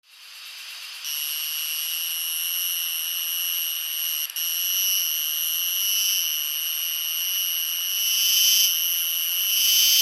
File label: Purana sagittata